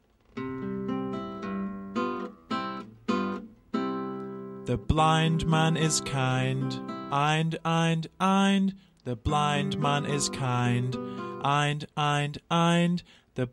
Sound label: chant; find the blind man